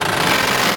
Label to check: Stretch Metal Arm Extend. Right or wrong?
Right